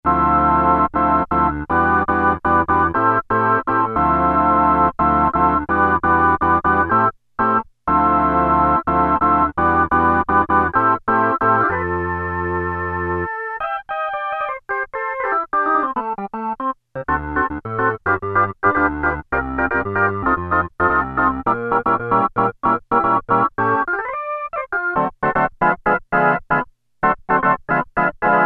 Jazz Organ, Casio MT-600; Tones

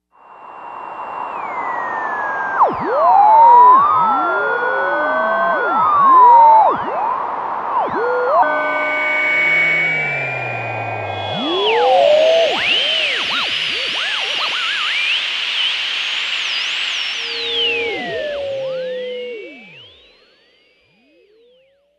Radio Dial, Novation UltraNova; Factory Patches

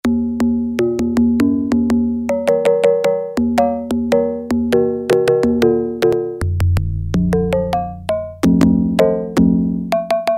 Hand Drum, Yamaha TX81Z

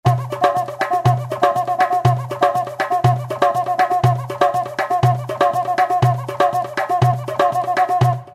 Comb. Loop; World; Roland SR-JV80-05

